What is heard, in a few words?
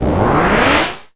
fog